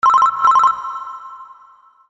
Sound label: Incoming Call; ICQ6.5